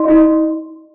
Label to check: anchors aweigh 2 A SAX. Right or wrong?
Wrong